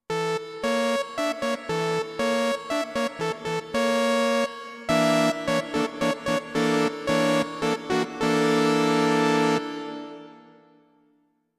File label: Sawtooth Ld 2